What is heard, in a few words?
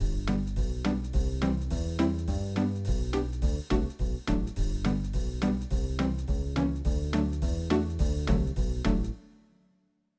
music; Match Minnie